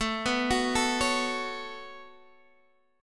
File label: C sharp 7th Suspended 4th Sharp 5th A